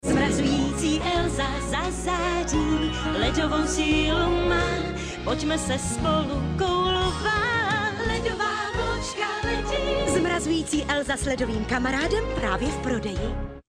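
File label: Female Czech commercial sing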